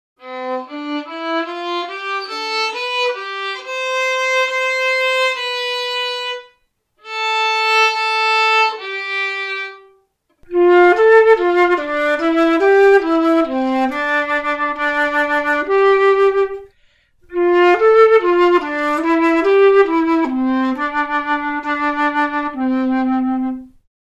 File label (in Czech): Housle Pricna Fletna